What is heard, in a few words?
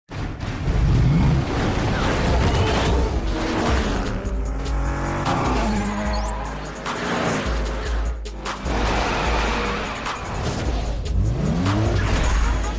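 Drifting Tone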